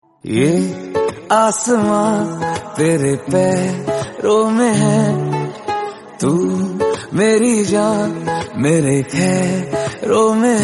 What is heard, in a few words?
Hindi Song